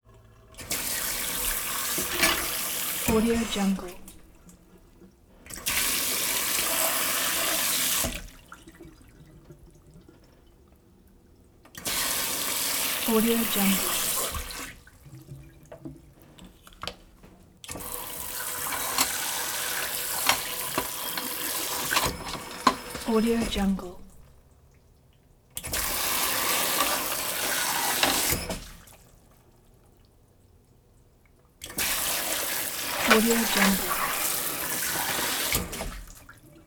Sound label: sink sprayer spray into kitchen sink; prosoundfx